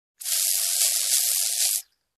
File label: Machine Pouring drinks